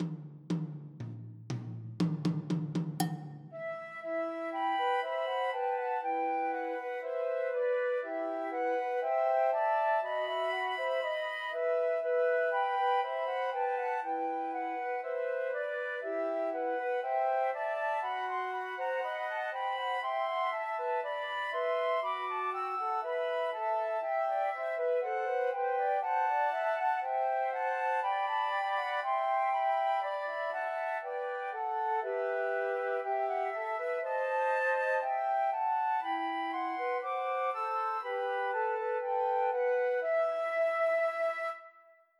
Trios for Three Flutes; God Rest Ye Merry Gentlemen; Christmas

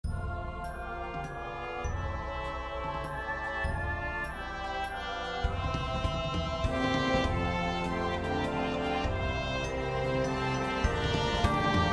Trumpet Sample Track; We Wish You A Merry Christmas

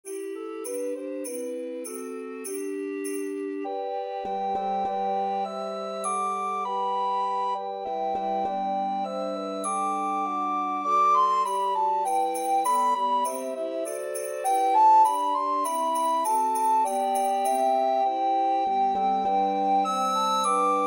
Recorder Track with Melody; The Holly and the Ivy

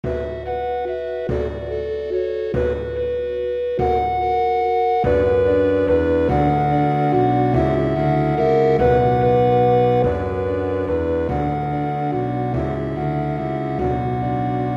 Track with Melody; We 3 Kings

